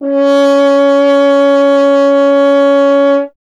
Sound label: F. Horn; Miroslav Vitous; Solo Instruments VOL-2